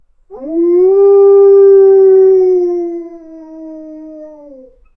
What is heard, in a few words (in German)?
realistisches wolfsgeheul, animal vocalization